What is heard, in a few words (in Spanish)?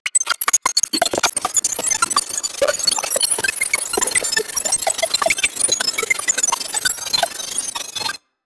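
pasos de un robot cibernético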